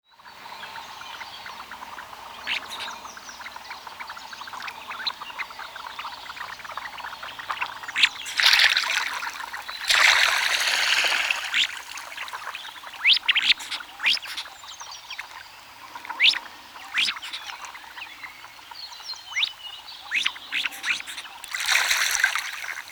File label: Mandarin